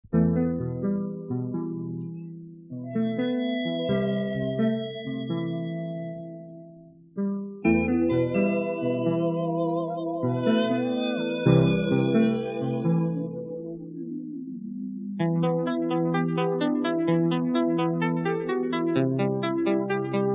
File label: Instrumental